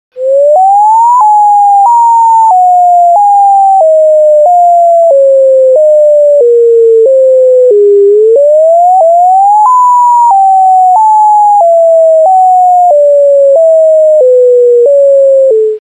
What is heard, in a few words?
Alternate Wail